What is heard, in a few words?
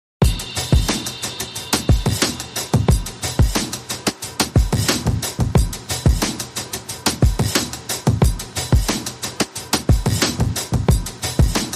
Short Freefall Beat